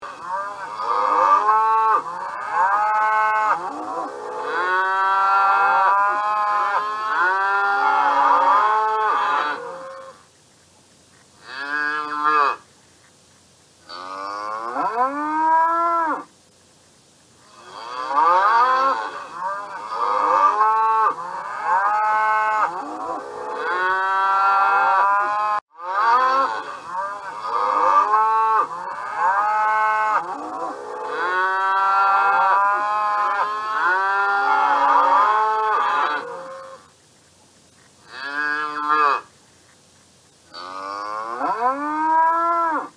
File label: cow sounds sound effects mooing moo loudly